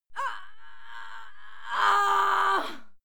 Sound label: female die moan heavy